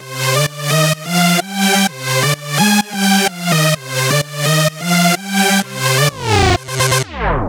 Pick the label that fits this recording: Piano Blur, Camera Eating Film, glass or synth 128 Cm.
synth 128 Cm